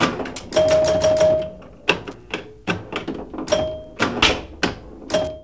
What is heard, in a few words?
pinball; Digital Sound Gallery - Volume 1; fun game